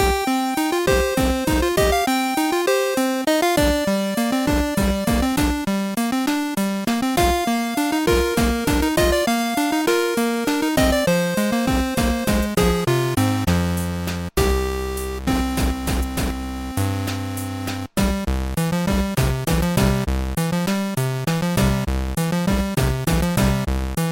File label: 8 Bit; Game of Thrones